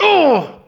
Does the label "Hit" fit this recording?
Yes